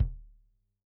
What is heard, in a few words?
K1 close Kick, drum